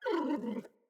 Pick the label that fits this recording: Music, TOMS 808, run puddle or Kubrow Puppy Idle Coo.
Kubrow Puppy Idle Coo